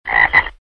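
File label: frog ribbit; camp rock; rock n row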